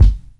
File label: round kick drum sound g key